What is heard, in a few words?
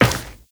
Run Metal, Footsteps; Warframe; Tenno